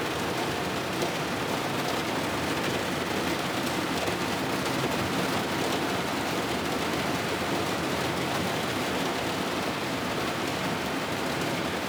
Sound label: rain roof 2d